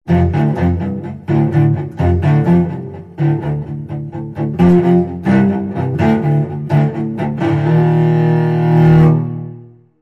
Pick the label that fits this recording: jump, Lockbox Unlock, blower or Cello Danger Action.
Cello Danger Action